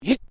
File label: MALE VOCALIZATION HAA; Exclamations; Voice